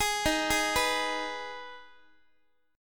Eb Suspended 4th Sharp 5th Eb Guitar 4A 4E 5A 5D 5G sharp 6C sharp; Ebsus4#5 arpeggiated; JGuitar